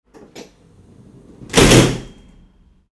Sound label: train door closing